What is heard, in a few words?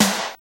Sharp Snare One Shot G Key, snare one shot tuned to the G note